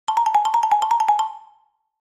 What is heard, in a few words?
Xylophone Cartoon